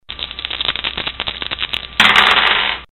Rolling A Dice

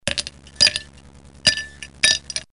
Ice Into A Glass

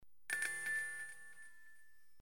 Nintendo DS Birthday Boot

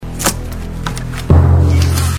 Box Open; Deal Or No Deal

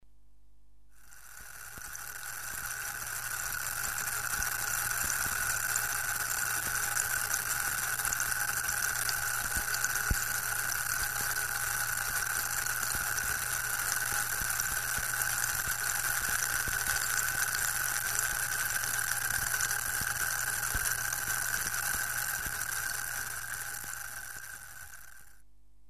Popcorn Boiling In A Pot

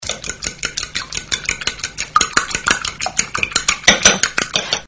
Beating An Egg

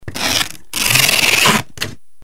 Sticky Tape Being Used

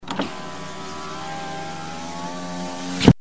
Electric Window

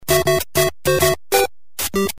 Level Start Jingle; Super Mario Bros

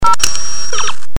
Time Circuits On, Time Machine Being Turned On; Delorean Time Machine Being Turned On; Back To The Future

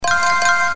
Bonus; Tipping Point

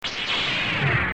Light Saber Open; Light Saber Opening; Star Wars Light Saber Powering Up

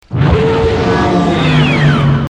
Speed Bike; Star Wars; The Speedbikes Used In Return Of The Jedi

